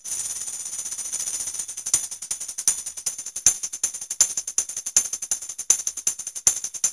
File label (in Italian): tamburello